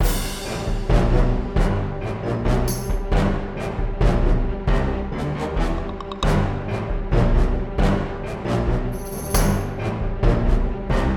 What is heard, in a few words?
Breaking News